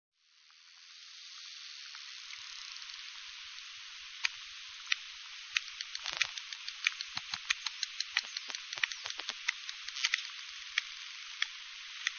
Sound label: bottlenose dolfin